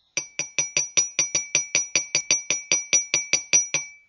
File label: Wide mug with homogenous cocoa